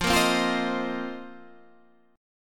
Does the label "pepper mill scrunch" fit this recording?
No